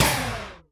Gut Wrench, Alesis D4 Efx

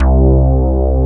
BASS, DANCE

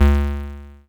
Stabs, Elektron MachineDrum SPS1 MKII

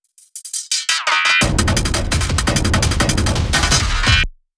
Cinematic Buildup 70 BPM; M8; percussion and foley

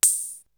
Open hat; ZERO G - Datafile DISC 3

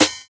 Snare, jungle breaks drum sounds

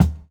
Kick, jungle breaks drum sounds

